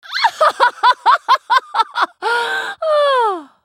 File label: laugh; LeBlanc